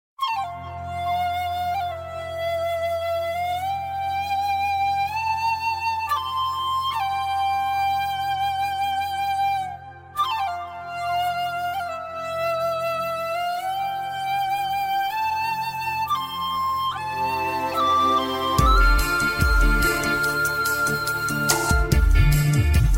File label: flute fantastic; Indian